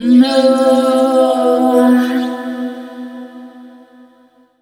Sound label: VOICE FX, Dance Vocals